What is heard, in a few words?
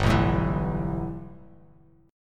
G 6th Add 9th G Bass Standard, chord; G6add9 strummed